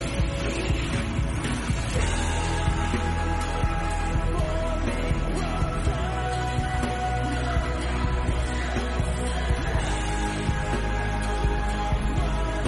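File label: entrance theme song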